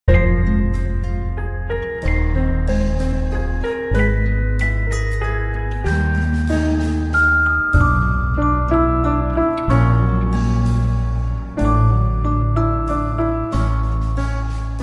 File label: outro music lose; freak fortress 2; isaac tboi